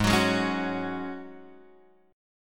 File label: G sharp 7th Suspended 4th G sharp, Guitar Open G